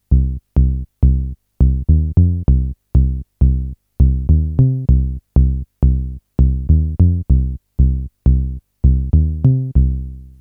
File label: JX10 JP4 comparison